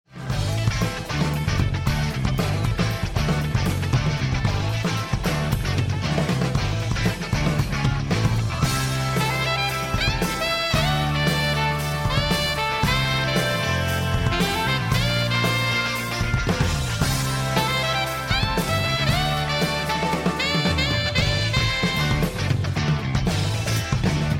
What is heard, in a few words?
bomb planted